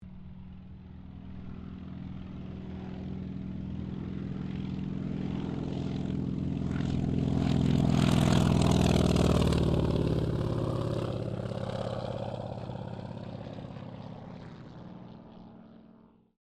AIRCRAFT CAC WIRRAWAY TAKEOFF

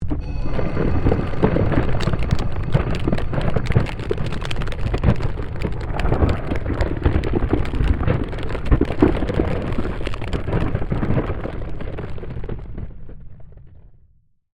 Created Magic Spell Glacier Quake; Series of created spell sounds

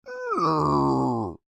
Creature Moan Irritated, Human; Groaning; Voice; Big Room Sound

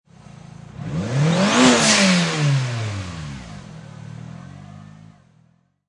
Mitsubishi Eclipse Engine Single Rev; Auto, Power Car, High Performance Car Sound: Mitsubishi Eclipse; Engine perspective; Revving, single motor rev; Lynne Music

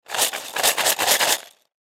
Toy Coins Plastic Shake Cardboard Box; Miscellaneous Toy; Blastwave; Foley

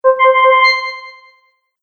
Fantasy Magic Accent; Magic Sound Effects

